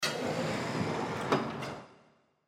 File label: Door Electrical Slide Open; Miscellaneous Doors; INDUSTRY; Blastwave